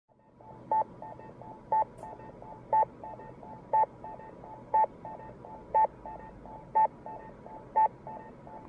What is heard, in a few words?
locator tone